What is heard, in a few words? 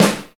snare cheese; Roland L-CDX-01; Snares 6; Sn Modules 6